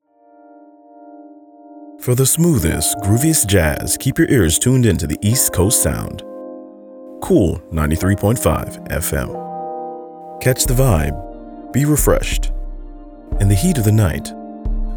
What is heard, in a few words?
Smooth Jazz Cool Jazz